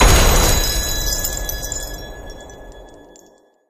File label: mega Win Text Change; jingle spin